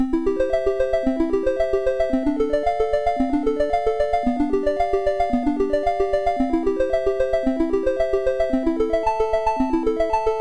Prelude in C Major, MOS6581R4

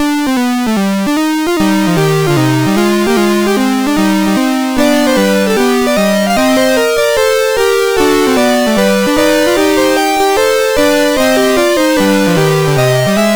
Fugue, MOS6581R3

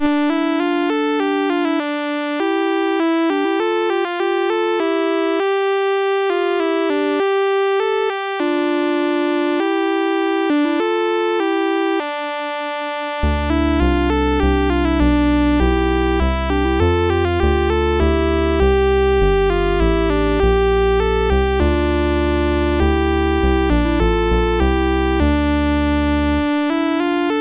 MOS6581; Vo Pole Tuman Zatumanelsya; MOS6581R2

